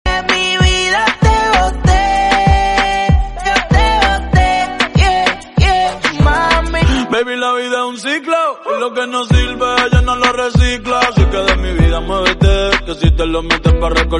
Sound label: remix